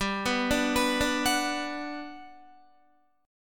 G Major 7th, G, down, Guitar, GM7 chord; arpeggiated; JGuitar

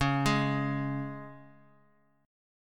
C sharp 5th; arpeggiated; C#5; x,x,11,13; JGuitar

